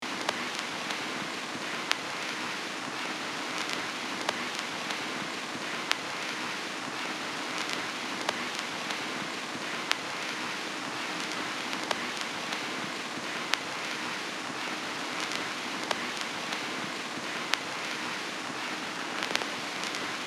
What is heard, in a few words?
Vinyl crackle noise vintage loop; vinyl and record player; gfxsounds